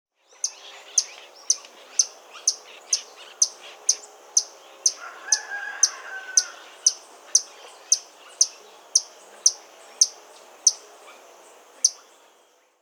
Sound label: Yellow-browed Tody-Flycatcher (Todirostrum chrysocrotaphum), Peru; S. America